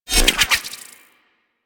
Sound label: Spin Attack; Warframe; Hyena Micro; Attacks